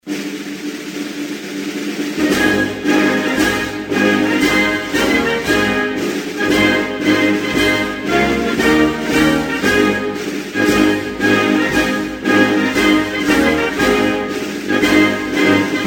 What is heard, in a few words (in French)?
Tunisie, Hymnes nationaux